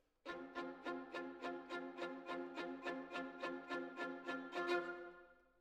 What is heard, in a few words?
Violin Solo